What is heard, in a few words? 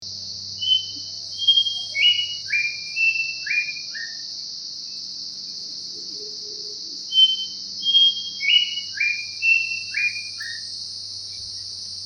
Pachycephala Simplex; Grey Whistler